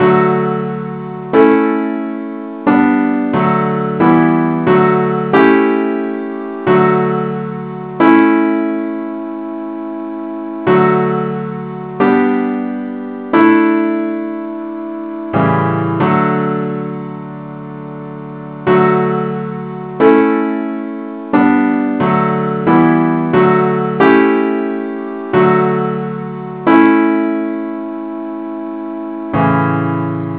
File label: simple Acc key d; Gwalchmai; Glory be to God on high